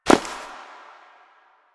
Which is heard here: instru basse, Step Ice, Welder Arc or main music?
Step Ice